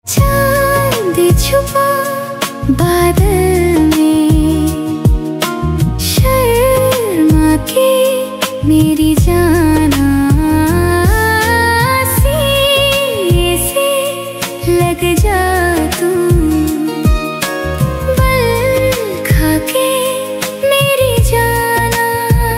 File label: AI Female Ringtone